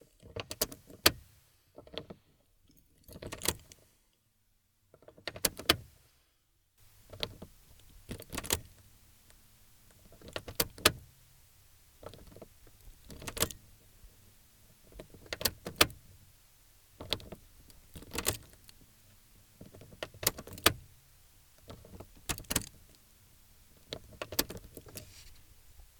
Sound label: Key Turning Key Turning In Car Ignition